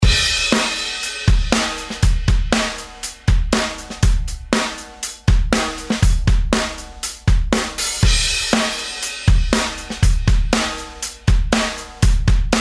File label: Rock n Roll